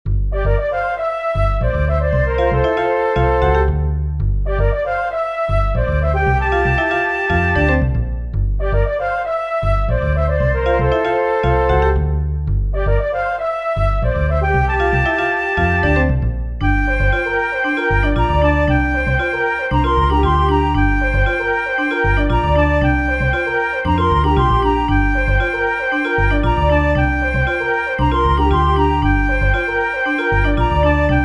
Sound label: Funk; 2019.02 WE Courcelles Sur Aujon